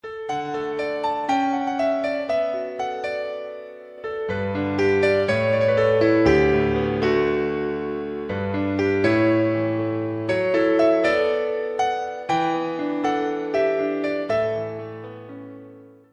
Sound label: bgm